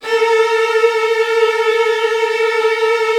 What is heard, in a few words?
VN TRILLS, Virtuoso Strings